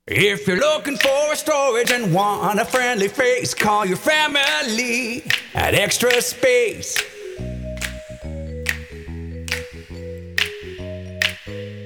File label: Donut, storage jingles; Extra Space